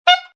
Sax Squeak, A saxophone squeak.; Funny Musical; Sound Effects:Musical

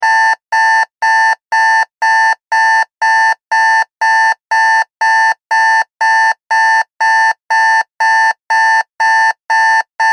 Alarm Clock Buzzer Beeps; Technology; Clocks; Machines

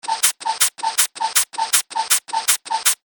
Cartoon Pump Loop; cartoon sound design

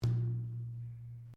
Wooden Column Impact Fist; Material components for constructing more complex sounds

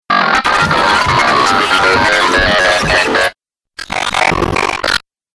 Dubstep Scifi Effects

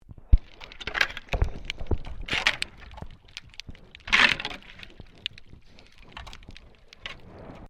MAINTAINENCE WORK UNDERWATER SHOVEL SCRAPING; Maintainence divers off StKilda Pier; Construction

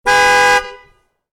Car: Horn, Short Blast, Exterior; Vehicles; Cars

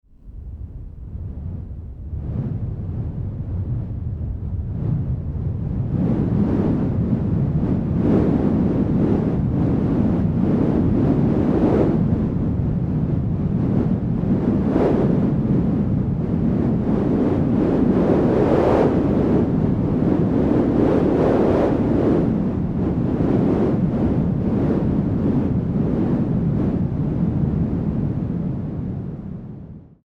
Wind, Blustery wind; Wind (Sound Effects:Weather)

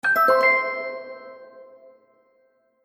Magic Purchase Ding; Cartoon Sound Design

